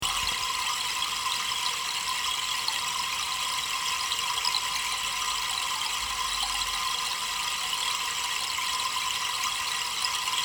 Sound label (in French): Arbre Pluie